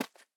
RIGHT FOOT CONCRETE COTTON DYN LOW

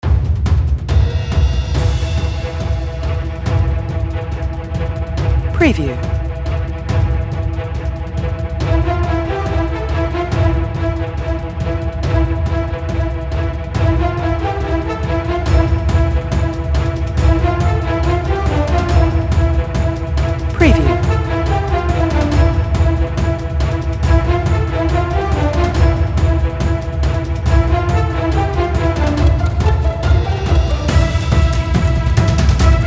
Cinematic Action Music Film Score Movie Theme

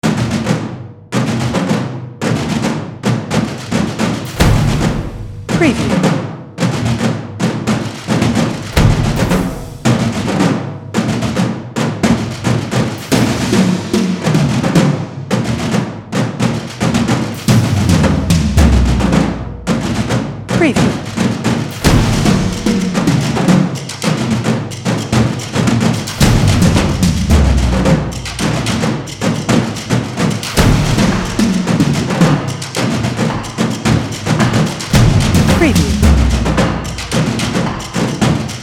Cinematic Fast Tempo Action Drum Hits Trailer

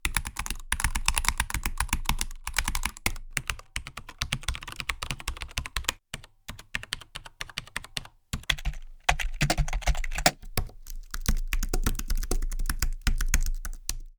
keyboard

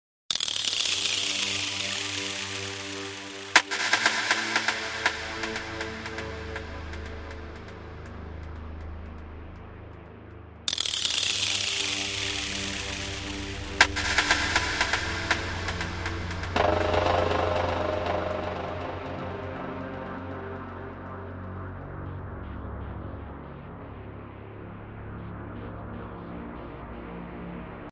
horror between the trees

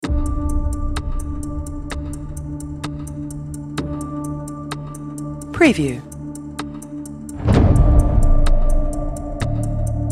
Cinematic Epic Hybrid Rock Action Guitar Trailer

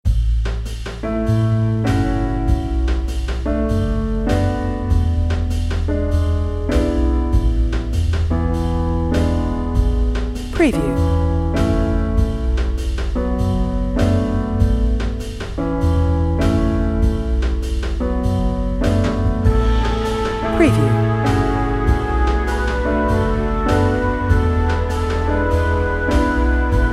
Jazz Music Cafe Piano Bar Lounge Trumpet

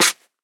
STOP CONCRETE COTTON STRONG

